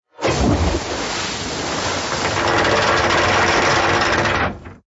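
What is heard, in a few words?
sounds of anchor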